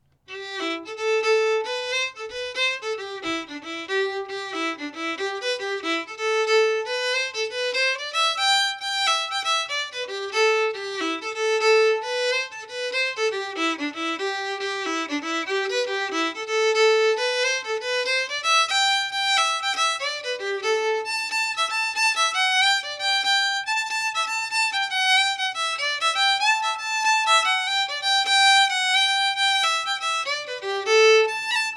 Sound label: Nightengale Jig learning version; Nightingale; FINALE JIGS